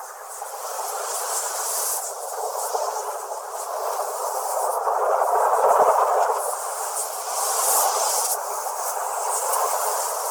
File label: Shield Bubble Loop; Enemies; Abilities; Ethereal; Beacon; Warframe; Zariman; Void Angel